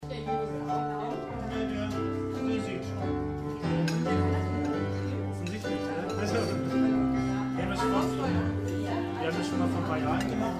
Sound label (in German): cafe noir musik